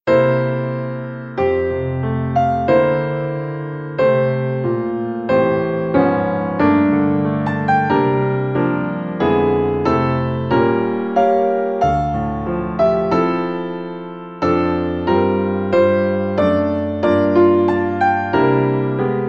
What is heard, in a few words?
Piano Accompaniment